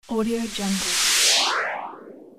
transition whoosh

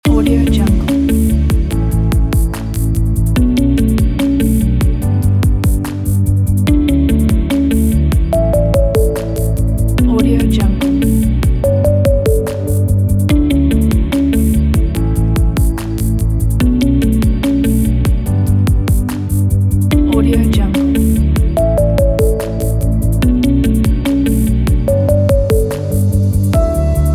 abstract corporate loop

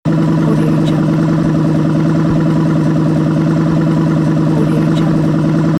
car motor engine noise

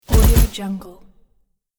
automatic weapon dull short shot sequence

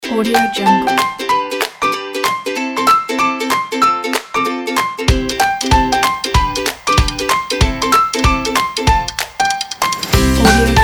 happy

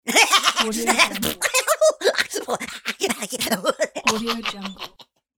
cartoon tasmanian laugh

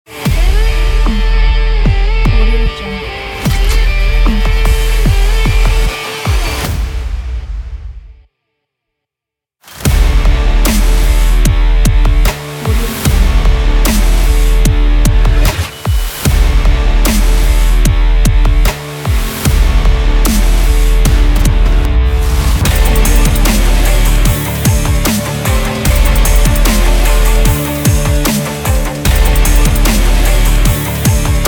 powerful cinematic rock trailer

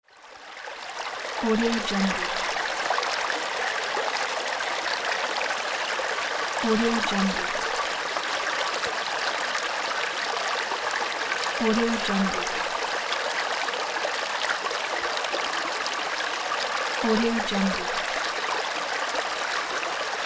river

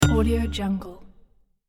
button interface

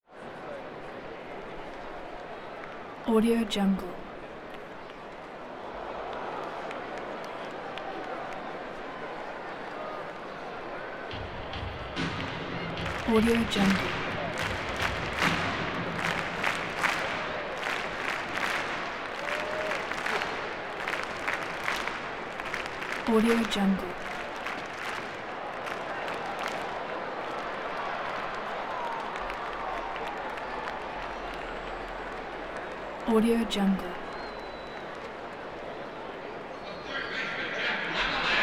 baseball crowd active rhythmic clapping

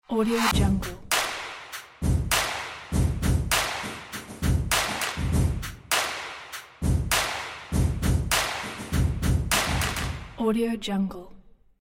sport drums logo

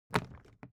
foley briefcase plastic pick up hard; smartsoundfx

